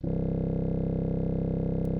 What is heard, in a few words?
Instrument